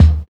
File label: dry kick one shot d sharp key, bass drum single hit tuned to the D# note